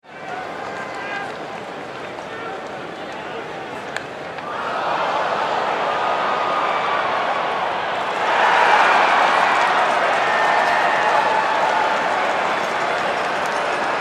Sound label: Crowd Cheer Baseball Game; Sports; Crowds; Backgrounds